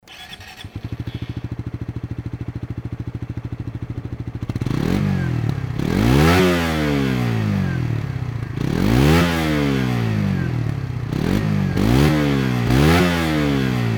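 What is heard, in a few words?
gsx-s125 normal, motorcycle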